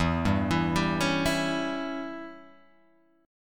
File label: E Minor 6th guitar chord, Open G